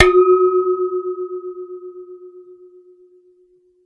Lid, Kitchen

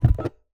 Single Shunt, DVD Drive External; DVD Drives

